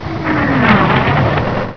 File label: battle shell; netpanzer